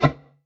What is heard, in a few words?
Sound Menu Error